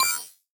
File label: remove one